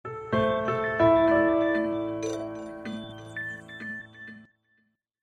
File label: new whatsapp notification